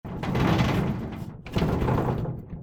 和室の扉がガタガタ鳴る音; door

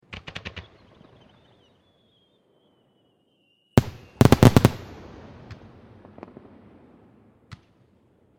花火の音; firework

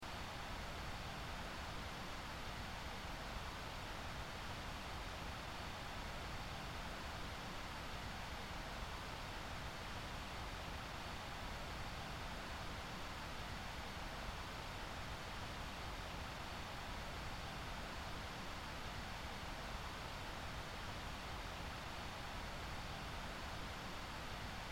森の環境音

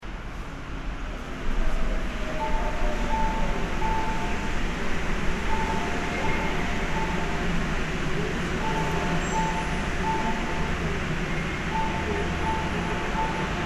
駅ホームに電車が到着する環境音

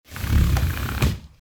畳の椅子を動かす音; chair

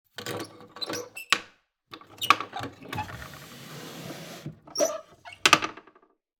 日本の民芸箪笥タンスの音; chest

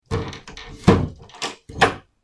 木扉の鍵を閉める音; door

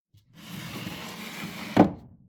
襖扉を開ける音; door

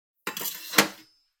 包丁をしまう音

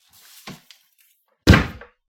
シンクの下の引き出しを閉める音

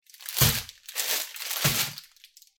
まな板に食材を置く音

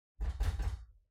扉をノックされる音

